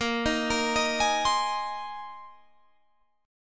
A sharp 7th Suspended 2nd Suspended 4th, Guitar Half Step Down chord